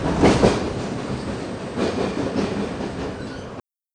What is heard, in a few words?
point, R62 Kawasaki, Train; OpenBVE